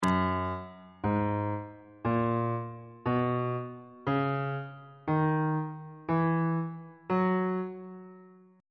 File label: f sharp mixolydian mode note positions on bass clef